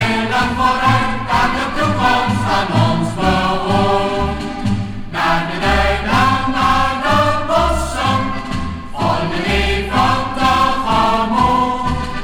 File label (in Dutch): Koor gemengd; Padvinders